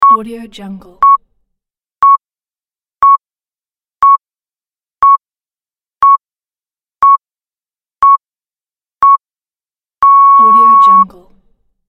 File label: countdown beep timer